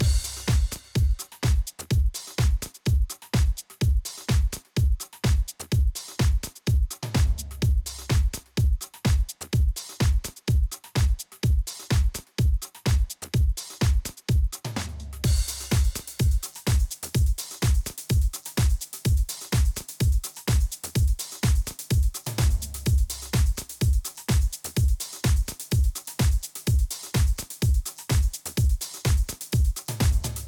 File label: beat